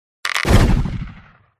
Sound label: cat attack voice bottom